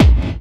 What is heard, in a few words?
KIT, Dance Mega Drums; Best Service